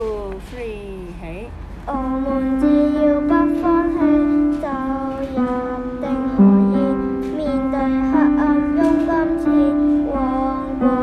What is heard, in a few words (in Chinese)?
舊曲新詞